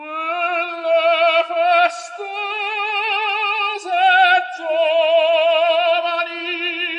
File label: Rough